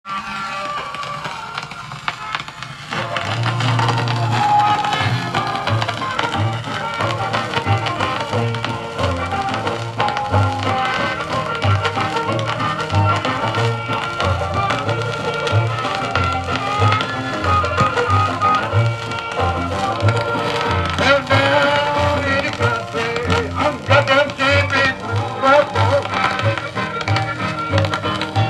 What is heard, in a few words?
Cane brake blues